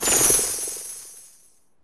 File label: casting spell heal